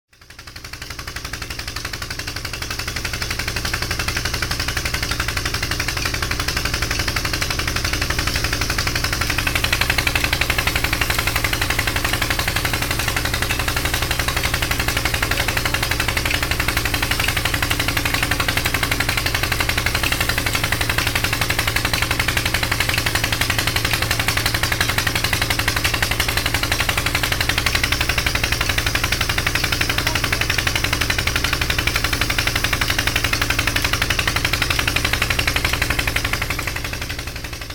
Dreschen Motor, Ladakh

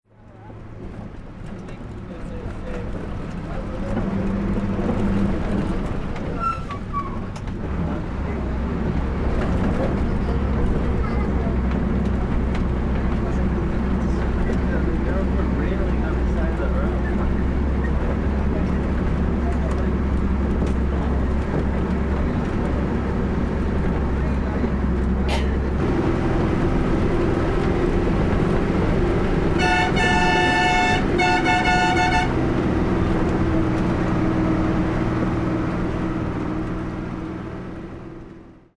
Bus Fahrgeraeusche, Ladakh

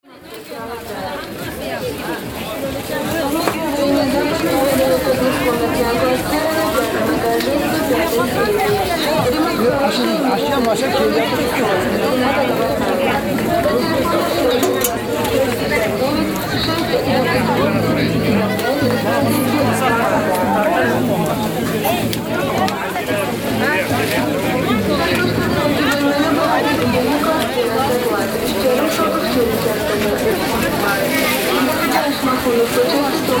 Bazar Osh; 2006-kirgistan

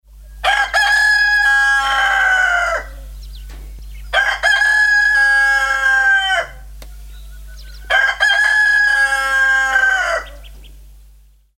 Kraehender Hahn KajiSay; kirgistan

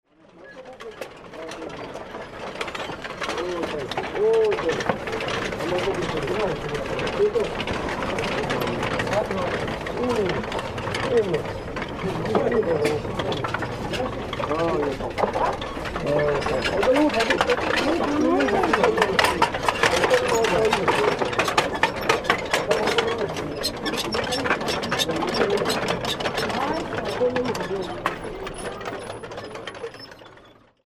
Prayerwheel, Ladakh

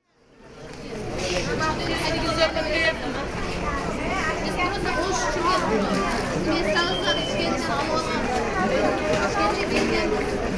Osh Bazar; zentralasien

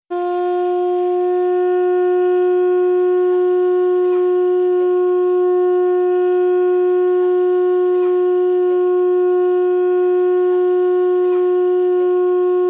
Muschelhorn, Ladakh

